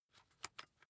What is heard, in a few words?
rock drill head remove regular; bohrer; soundpool